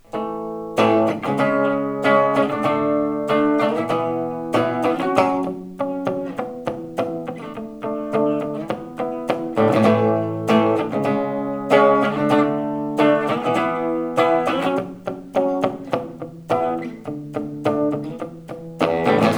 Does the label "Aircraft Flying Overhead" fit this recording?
No